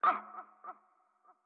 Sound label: Hurt; StyxMech